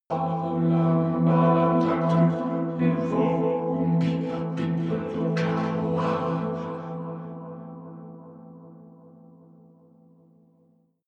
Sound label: vocoder vocals